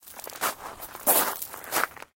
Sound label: rocks scuff; Golden Crayon Sounds